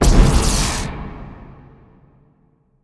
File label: fire; smite; god scylla